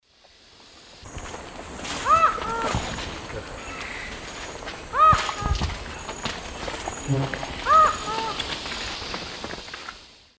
Herpetotheres cachinnans, calls; S. America; Eastern Lowlands

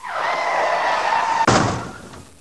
car crash

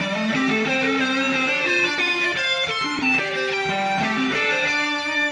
Shift Delay Guitar; Guitars

